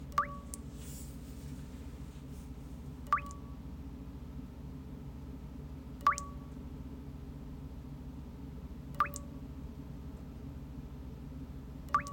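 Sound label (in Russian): капает вода в металлическую чашу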